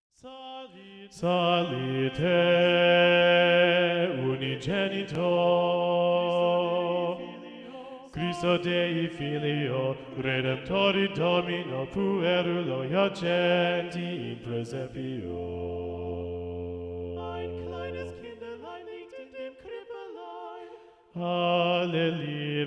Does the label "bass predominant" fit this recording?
Yes